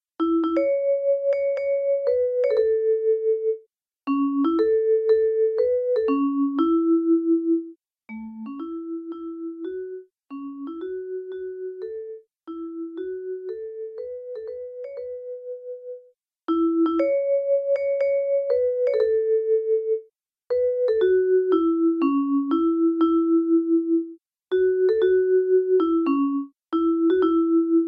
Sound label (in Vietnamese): nhac midi